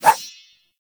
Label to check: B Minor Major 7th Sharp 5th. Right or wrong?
Wrong